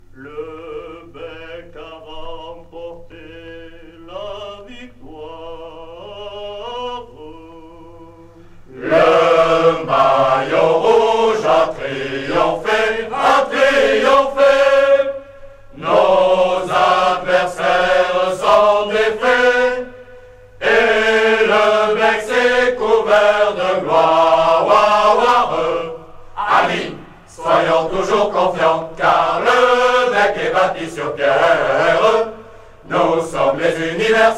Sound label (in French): Les Chants du BEC